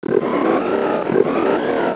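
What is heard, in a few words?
antlion preburst scream underwater; npc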